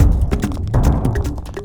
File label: Mus Village Build 145 Loop; ps3 windows xbox 360 gamerip 2011; From Dust